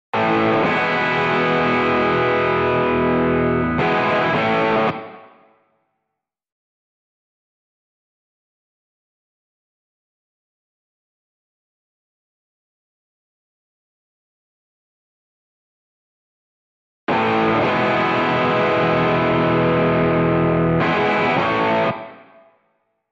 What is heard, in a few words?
Guitar